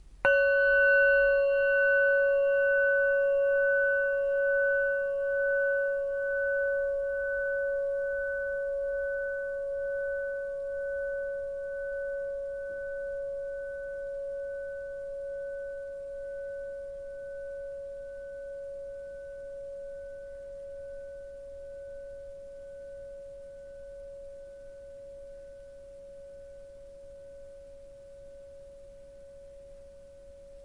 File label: Bowl